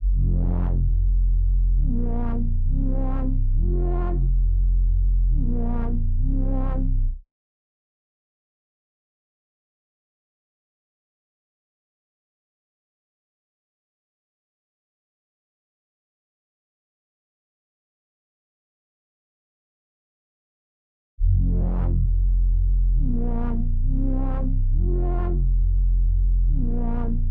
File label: 136 BPM F Min Bass; Melody; Reverse; Loop Stems; Cymatics; 2022 Melody Collection; Cameras